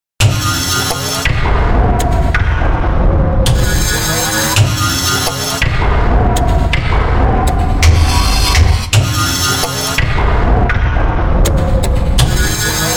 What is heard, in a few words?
valse machine; compositions pour le theatre et le cinema; fatzer